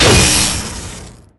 Bullet Hit

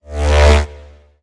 Gravistar Charge

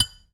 Mine

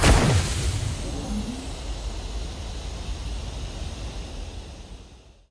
Smoke Bomb; Desert Prowler

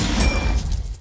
Striker Doubles; Sevens

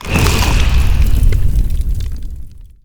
Dragonfruit Consume

